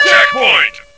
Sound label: checkpoint